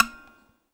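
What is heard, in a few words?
TZRS PIZ E 2, tzouras pizzicato; Soundscan vol.53; Mediteranean Traditions; AKAI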